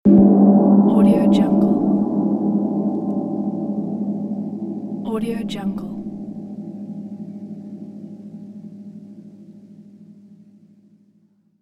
chinese gong

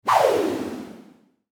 whoosh

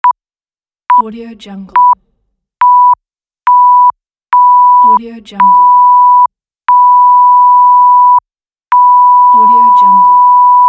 censor beep

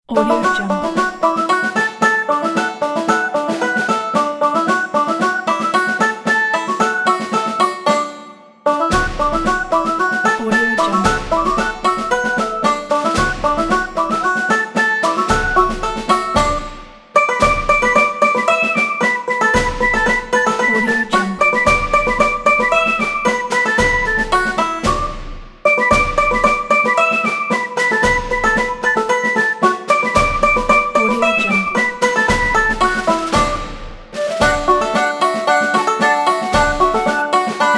irish march

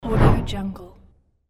woosh

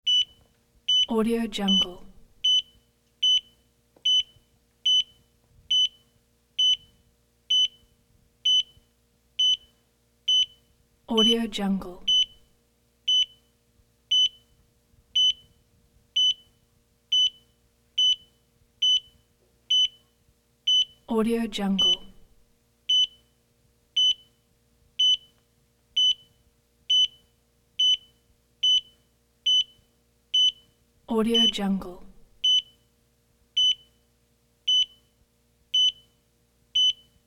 hospital ekg electronic beeps; sound ideas

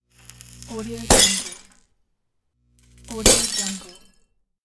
light bulb explosion

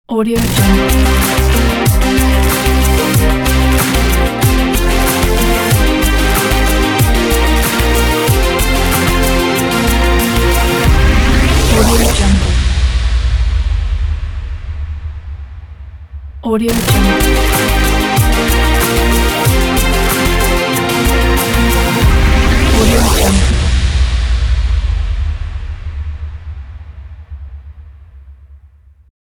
bright ident

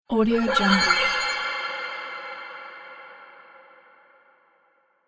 water illusion spell

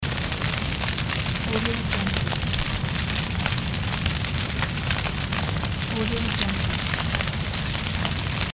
fire loop

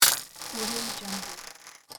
scifi cloaking device activation

